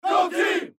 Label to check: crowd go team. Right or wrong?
Right